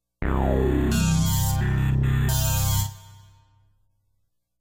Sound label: Moog Alert